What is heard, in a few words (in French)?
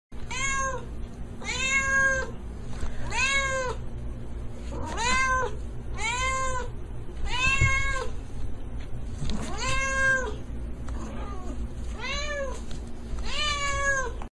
bruit de CHAT miaulement; animaux